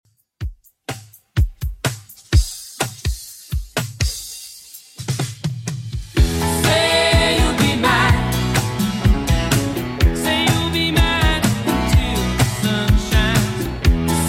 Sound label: 80s Redrum 125bpm